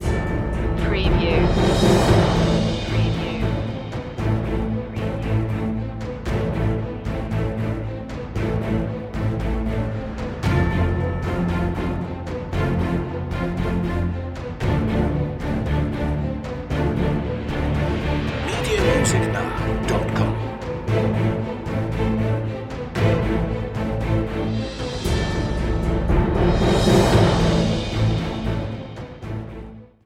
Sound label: no choir